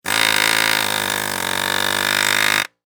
Industry Air Hammer Operate Impact Wood Long; Hammers; Tools; SFX Bible 6

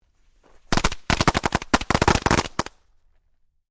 FIREWORKS CRACKER SMALL CLUSTER; Variety of fireworks being detonated

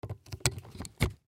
Desk Lamp Plug In, Desk Lamp Plug In Wall Socket; Office; Household; Blastwave

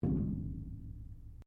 METAL IMPACT QUIET; Metal Sound Effects; Metal (Sound Effects:Materials)

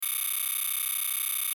DATA STREAM; Digital; Multimedia; Sound Design; Blastwave

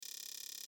Text Readout; Digital; Multimedia; Blastwave

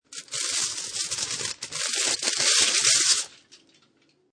Metal Foil Aluminium Foil Rip; Ripping; Sound Effects:Objects

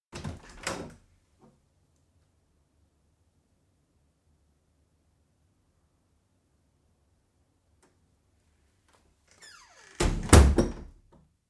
Back door, interior, open & close; Miscellaneous Doors; BBC

